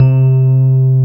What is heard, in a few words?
piano Rhodes; Sound & Vision; Gigapack I CD 2; PIA E-PIANOS